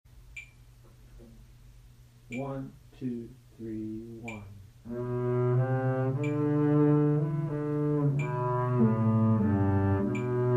tempo 92 dotted quarter bass; scheherazade

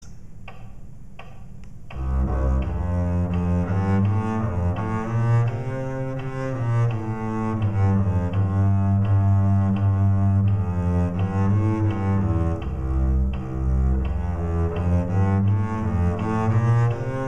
fast bass; simple gifts

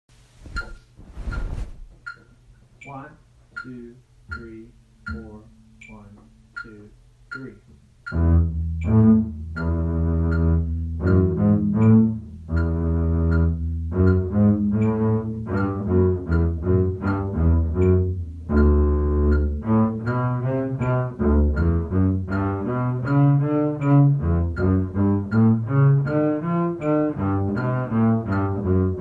march slow 80 2nd repeat bass

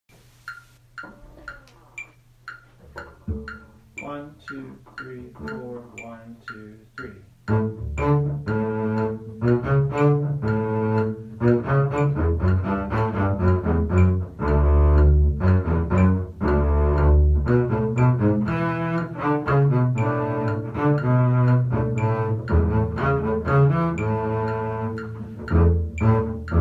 march 120 no repeats bass

